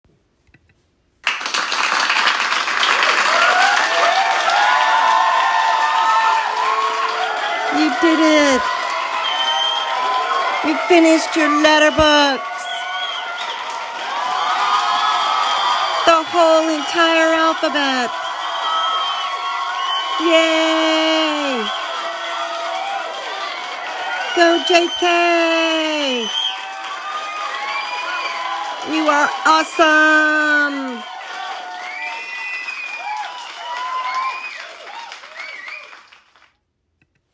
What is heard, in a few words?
Letterbook Cheer